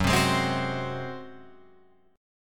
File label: F sharp Suspended 2nd Flat 5th, Guitar, Open F; strummed; 6,7,8,7,9,7; down